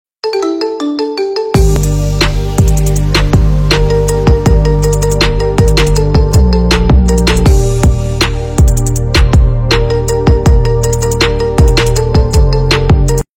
Pakistani Ringtone

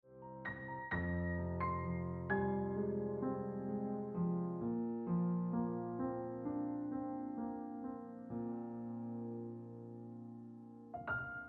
Gentle Ringtone Piano Music

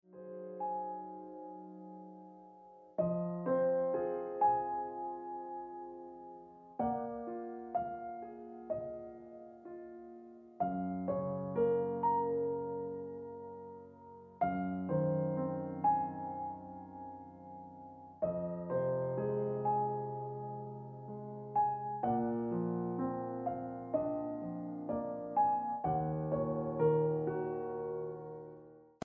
Ringtone Piano Music

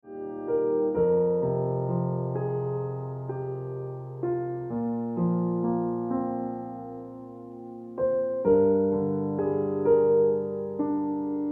Ringtone

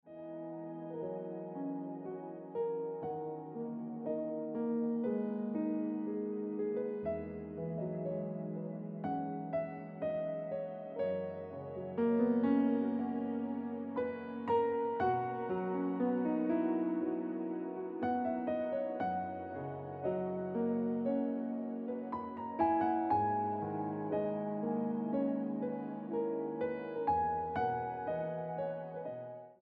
Piano Music

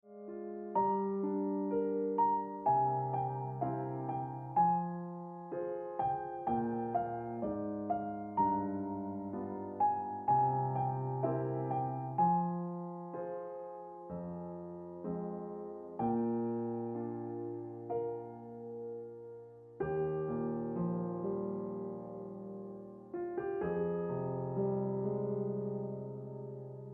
Piano Music

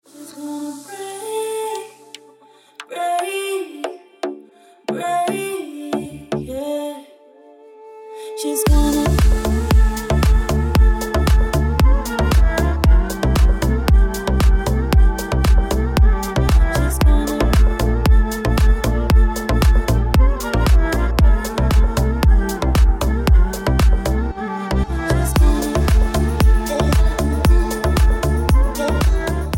Ringtone

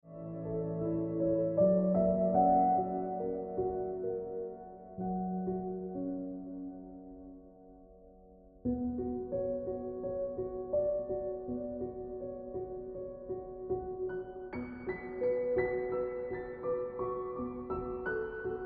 Ringtone Piano Music

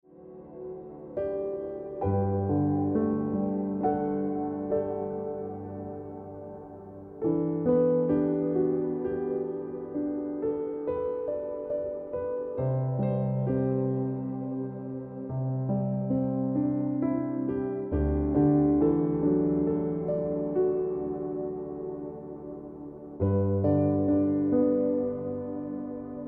Ringtone Piano Music

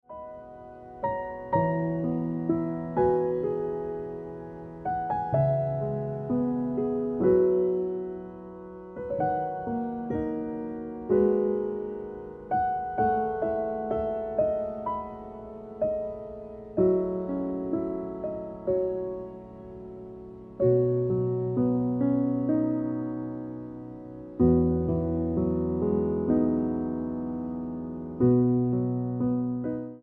Ringtone

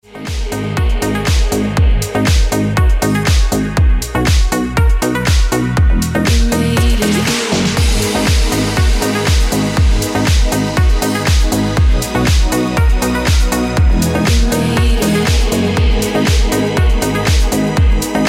Ringtone Deep House Music

